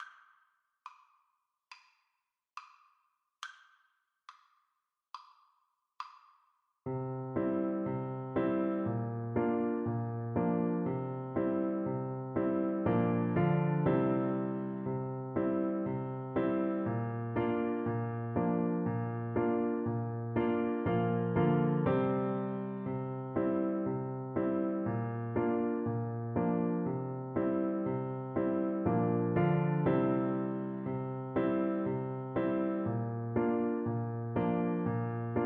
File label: french horn